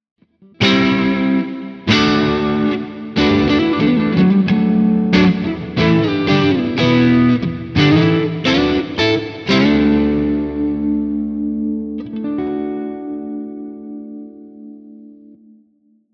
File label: gritty clean